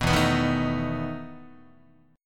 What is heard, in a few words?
E Major 7th Suspended 4th E down Guitar